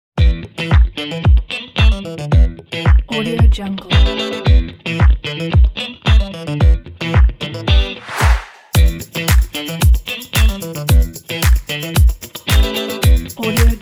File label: groovy funk; jaredstevenson